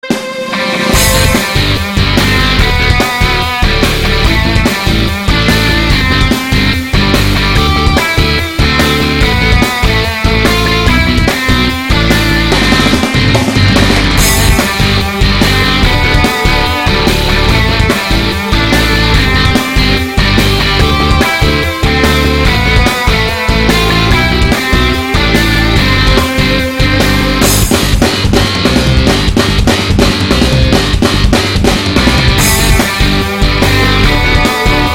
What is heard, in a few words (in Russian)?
happy birthday to you rok versija